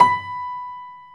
PIA; Best Service Gigapack 1

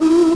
Voice Choir; Genesis

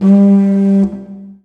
DIGERIDOO; Best Service; World Colours

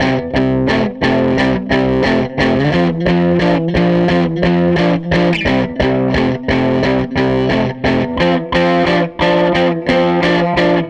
Tele Guitar Loop

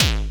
Distor BD, dry kicks; Quasimidi Rave-o-lution 309

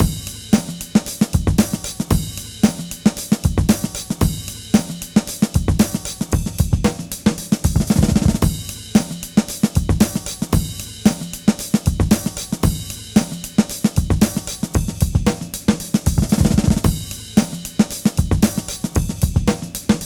114 Ride Open, Breaks; Lockdown Breaks

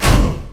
TR kit, techno kit; Pro-Rec - Synthsonic

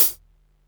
hat, Techno 138 BPM

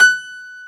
PF F#4, GRAND PIANO; AKAI S6000 CD-ROM - Volume 1

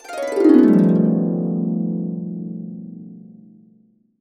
A, Harps; USB Soundscan vol.62 - Complete Harps; AKAI

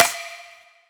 snare for breaks

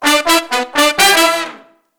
BRASS LICKS, Disco Fever; Best Service ProSamples vol.48 - Disco Fever; AKAI

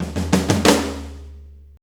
90 BREAK, DRUMS

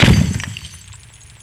TUBE IMPLOSI

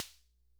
Snare, sr-95; classic machines

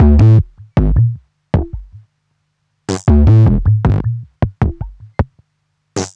Modular Loop, Modular Percussion

